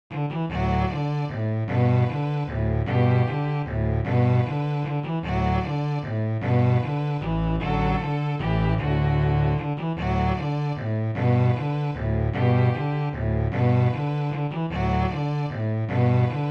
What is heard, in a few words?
Quartet; Folk Quartets